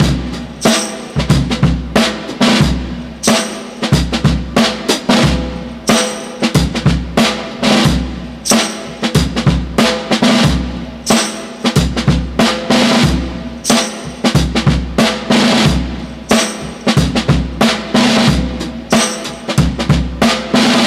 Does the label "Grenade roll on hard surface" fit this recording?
No